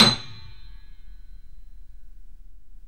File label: KEY, Steinway ff